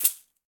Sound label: Caxixi Hand; Shaker